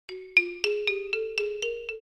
Cartoon sneaky tiny walk

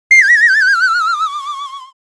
Cartoon falling down whistle

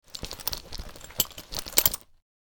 Police duty belt gun belt

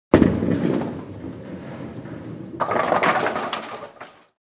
Mini bowling strike

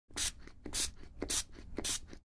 Windex bottle spraying many times

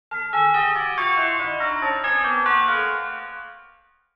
Cartoon descend chimes

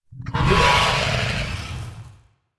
Juvenile dragon roar

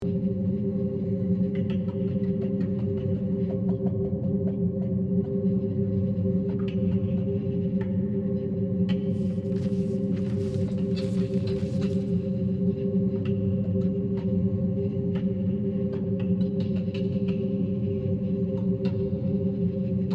Ancient catacombs ambience exploration loop

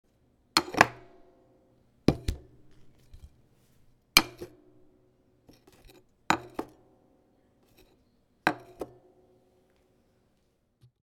Dinner table being set plates bottles and glasses

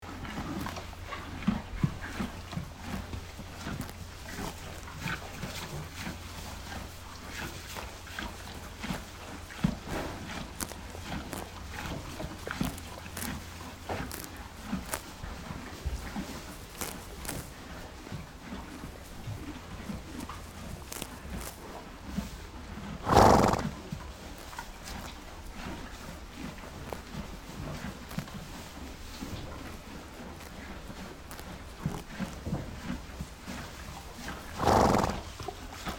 Quiet farm stable ambience loop

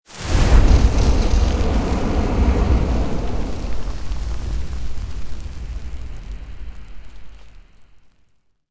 Flamethrower shooting fire long burst

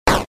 Arcade 8 bit shot

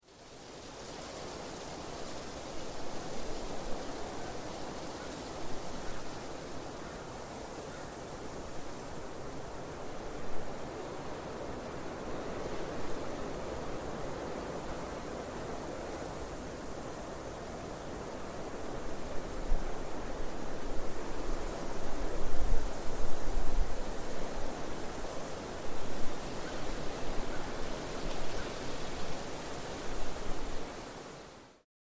Wind blowing with woods squeaking and tree leaves

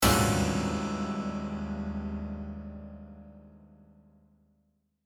Horror piano chord crash piano lid haunted

